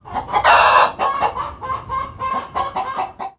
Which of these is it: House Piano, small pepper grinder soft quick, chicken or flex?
chicken